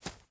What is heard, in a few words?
footsteps grass